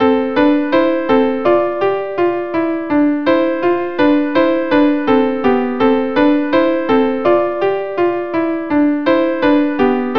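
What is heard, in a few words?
violin violin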